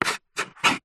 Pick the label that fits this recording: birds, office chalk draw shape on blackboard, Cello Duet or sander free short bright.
office chalk draw shape on blackboard